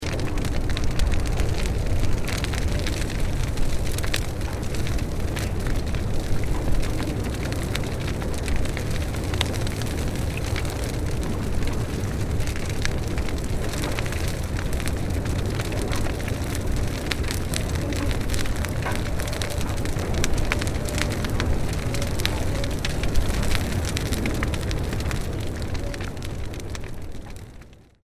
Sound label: fire